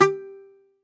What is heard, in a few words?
Greek Bazouki, Plucked Strings